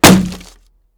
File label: shield light block small